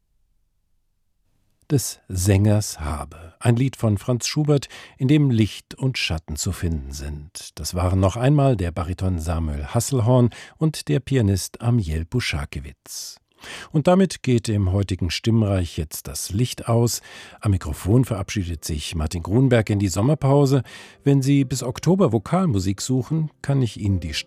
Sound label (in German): Vokalmusik